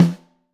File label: Snare Top